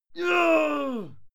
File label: male fight scream pain muted; soundpool